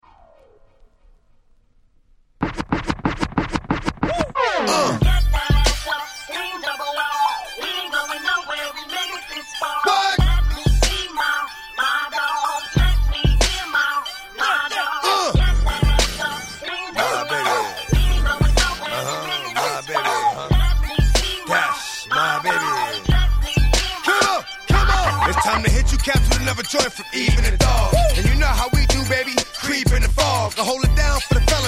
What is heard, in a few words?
Scream